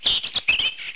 bird; Deers Revenge

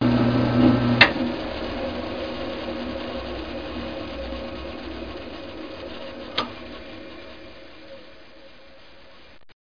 car off; Broderbund Print Shop Multimedia Organizer Deluxe Edition

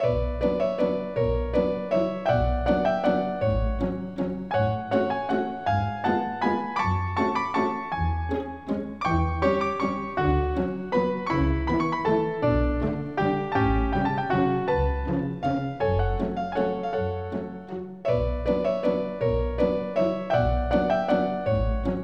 WALTZ; PC World Interactive 9 - Temmuz 1998; muzik; mwdemo

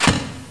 MENU Sub Selected; axisdemo; Interface

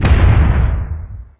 mech footfall

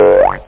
spring

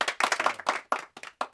applause sparse; PC Gamer IT CD 45

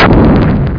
Player Hit; Missions of the Reliant!; Player Hit 2

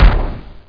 bomb; CD Action

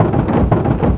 OFFROAD; Andretti

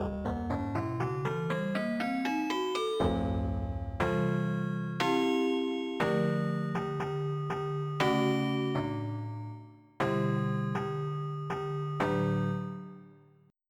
Celesta; sblive

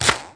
shoot arrow; Cupids Match Up

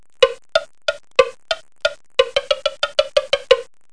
slide

